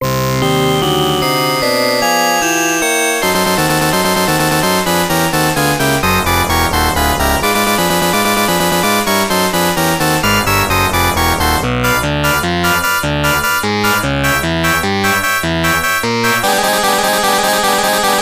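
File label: background music; The Speed Rumbler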